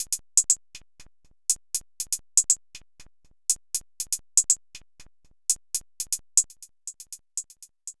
Bossy Hat Beat, Electronic Beats; Sonic Foundry (Sony Creative Software); Myths of Technology

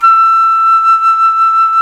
jazz flute

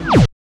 BOING; Distored Reality

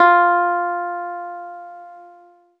ZITHER; Best Service; Alpine Volksmusik

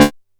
D3, Bass; E-MU Producer Series Vol. 6 – Analogia Project; Boom da Bass

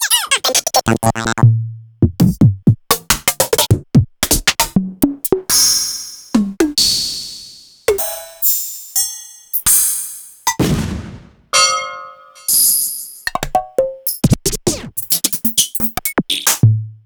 Korg Triton Tricky Kit

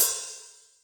H Opn, Hi-Hat, Yamaha DTXpress

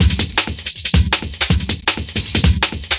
Classic Beat, Drum N Bass

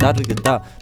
INDIA L, IND ENS PH, Heart of Asia; Spectrasonics - Heart of Asia CD2

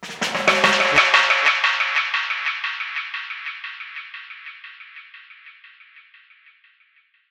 Dub Roll; Zero-G Sound Sense Grime Scene; 90 s Sample CDs

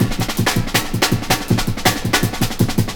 breaks 162

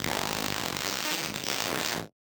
Casio, Glitched, malfunctioning synth

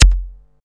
XTRA PERC

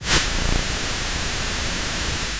Misc Noise

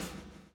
BLOW; Spectrasonics - Backbeat

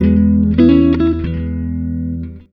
Blues, Electric & Acoustic Guitar Loops

